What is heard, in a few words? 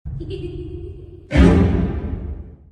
tension w giggle